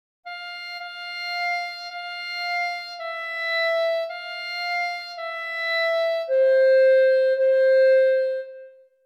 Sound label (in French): CLARINETTE; Psaume127